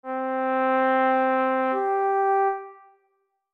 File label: tekiah; AJ Hipkins